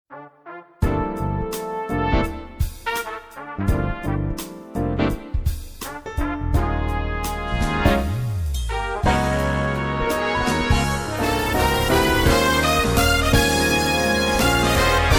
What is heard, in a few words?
Big Band